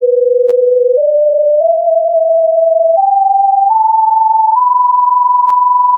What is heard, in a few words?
wave Cmajor